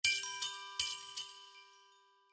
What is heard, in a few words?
Shiver Notification, Sleigh Bells; HTC